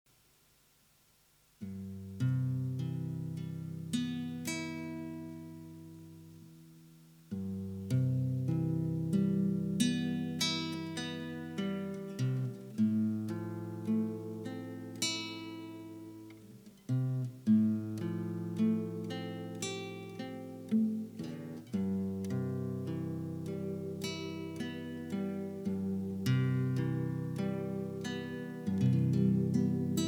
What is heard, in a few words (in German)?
Musik